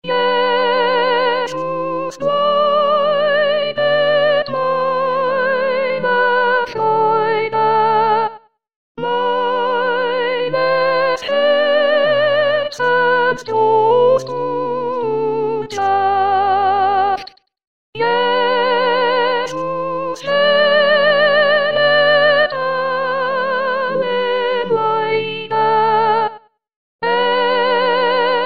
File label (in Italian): Jesus bleibet meine freude soprani, voci guida; corali in vaticano 2018